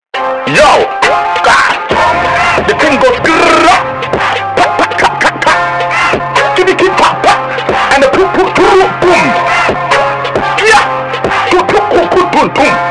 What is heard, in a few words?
skrrrrraah